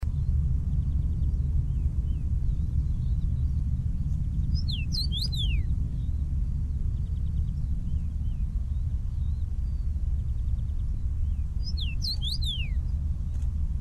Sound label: Common Rosefinch; پرنده توت خورک